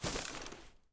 grass, human step; grass2; Soundscape Overhaul

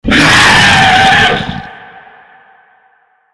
attack hit, monsters, lurker; Soundscape Overhaul

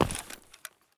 default, human step; Soundscape Overhaul

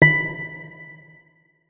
notes, guitar; Hideout Furniture

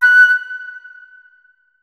flute notes; Hideout Furniture

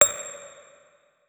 notes, guitar; Hideout Furniture

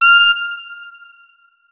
notes, saxophone; Hideout Furniture

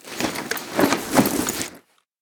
heavy, Vaulting; ledge grabbing; Ledge Climbing Mantling

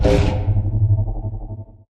flash shield, anomaly; Dynamic Anomalies Overhaul; eugenium anomaly

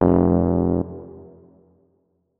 notes, keyboard, electric piano

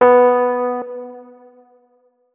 notes, keyboard, electric piano

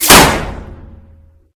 metal, bullet collide; Anomaly Bullet Cracks and Impacts Redone

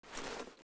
open empty, Spas-12 weapons; Spas-12 Reanimation

